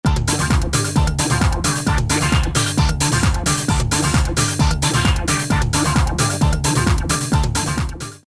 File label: Filter Disco, Roland D2